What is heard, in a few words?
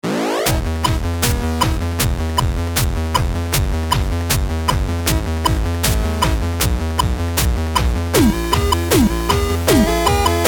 bomb ten sec count